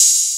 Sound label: Hat